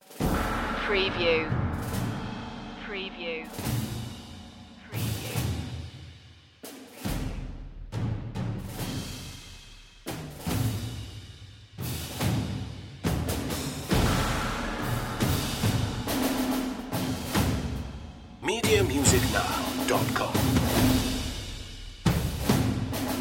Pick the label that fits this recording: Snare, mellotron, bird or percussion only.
percussion only